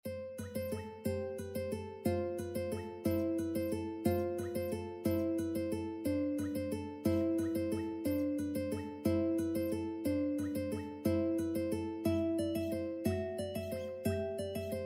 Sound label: carol of the bells, ukulele